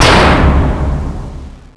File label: slam